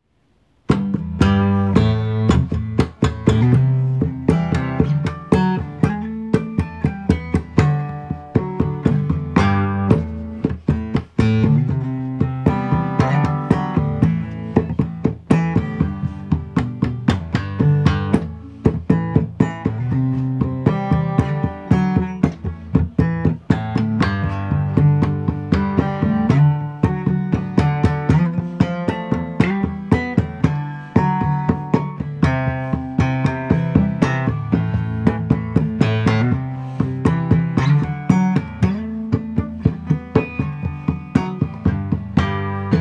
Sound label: acoustic; music smorgasbord